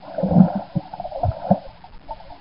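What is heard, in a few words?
SFX SWIM UNDERWATER; Blood: Fresh Supply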